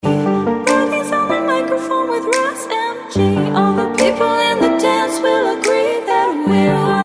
B Vox

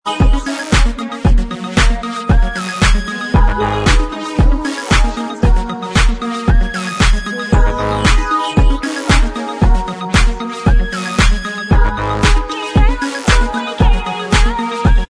Inst Track

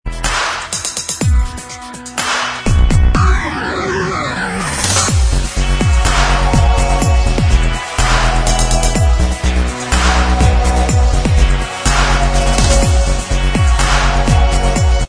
dubstep remix, Inst

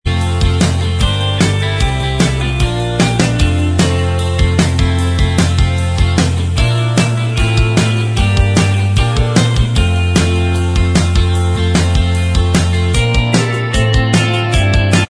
Inst Track

